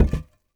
barricade concrete deploy; Rust

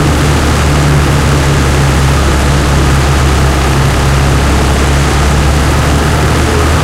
su 100 engine int rpm 99 load, tanks; engines; War Thunder

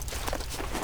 RPG reload lift, foley; Call of Duty Modern Warfare

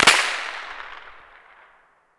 fire far; Escape from Tarkov

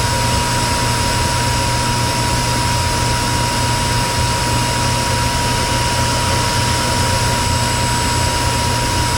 Aircrafts Engines F86 In Engine; DCS

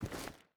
footstep rubber boots stones walk; Rust

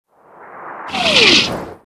artillery incoming; Call of Duty Modern Warfare

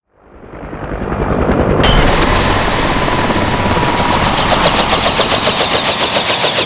heli crash; Call of Duty Modern Warfare

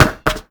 car tire bounce; Call of Duty Modern Warfare; physics

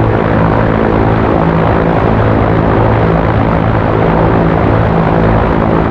Aircrafts Engines UH1H Tail Rotor Side; DCS

